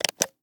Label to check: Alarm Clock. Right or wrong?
Right